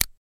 Zippo Lighter Close; lighters & matches

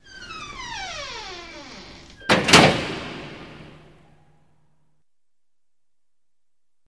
Classroom Door Clos

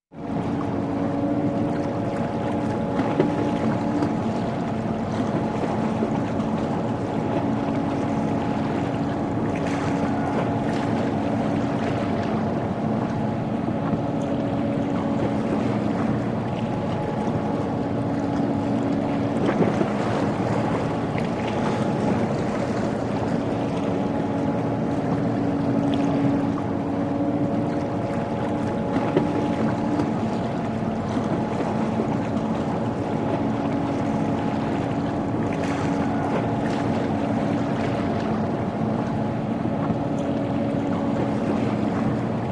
Long Beach Harbor

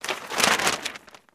Newspaper Page Turn; paper mvmt

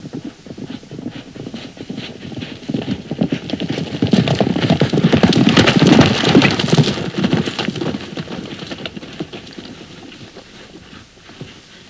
Horse Gallops In Stop

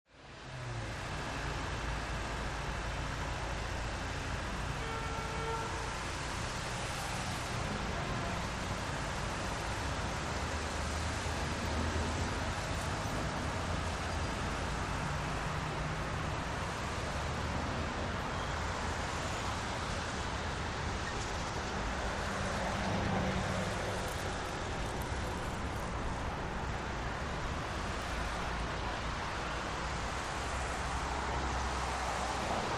New York City Street, big city

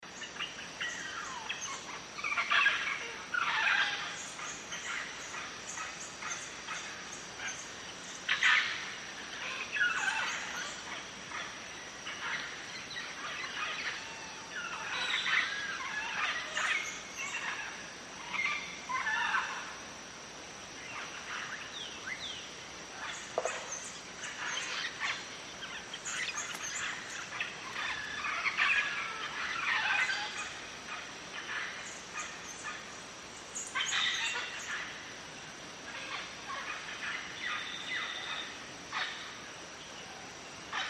Guatemalan Jungle Gr

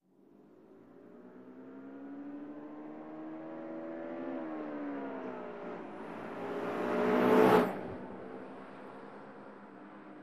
Bus By Fast Gears Away

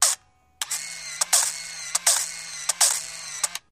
35mm Camera Auto Adv; shutters & movement

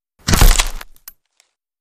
Bullet Impacts Wood I

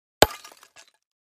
Clay Chimney Vent Hit, concrete & brick

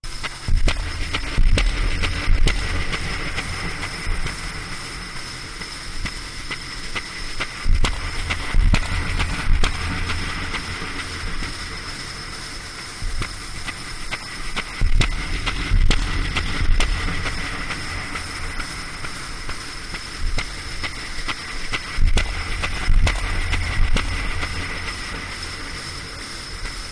Rhythm Ace Machine, musical

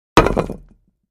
Concrete Impact, concrete & brick

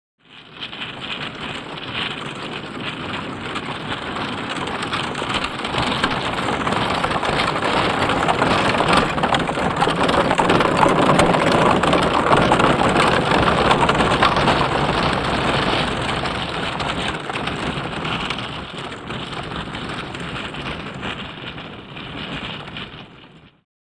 By Horse Trap Up Past N

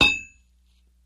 Crowbar Metal Hit

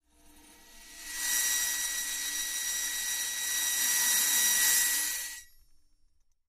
Bells In Cymbal Bow, cymbal & gong; Mini Bells In Cymbol Bow

